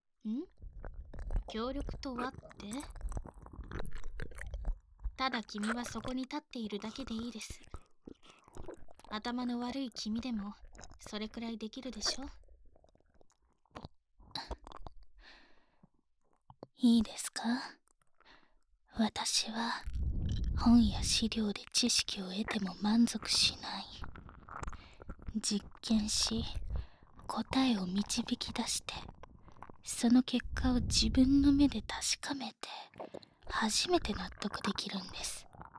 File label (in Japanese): 耳舐めされながらクールなリケジョにディープキス・乳首舐め・手コキ・フェラ・騎乗位される音声